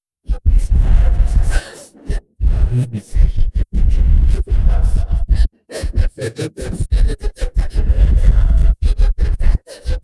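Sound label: backstage tense vibe muffled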